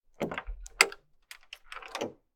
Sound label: door naproom open sneak; anemoi